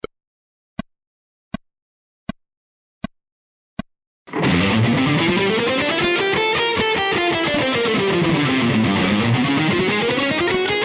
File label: scales combining rhythmic variations fast